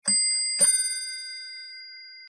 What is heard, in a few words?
lg Bell, hermle kaminnye chasy; hermle 22876; interernye chasy